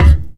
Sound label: physics cube impact hard